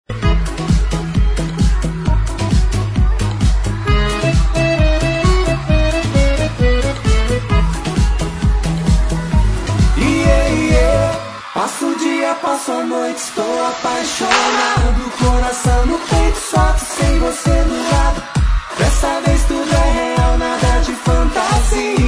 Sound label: SAMBA